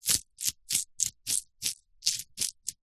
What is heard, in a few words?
human ear finger inside canal movements unblock